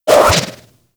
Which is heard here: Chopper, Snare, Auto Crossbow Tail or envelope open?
Auto Crossbow Tail